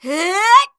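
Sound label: MON ATTACK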